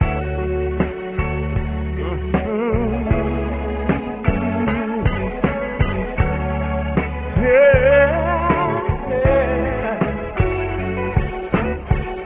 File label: voice and strings; Loops; Phonky